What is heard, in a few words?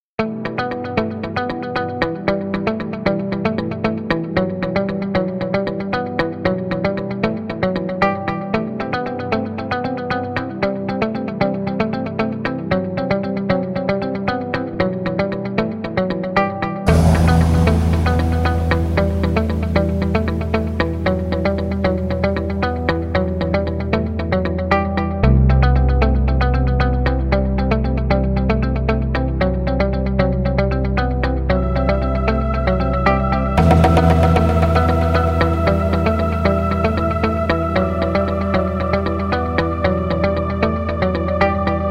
Instrumental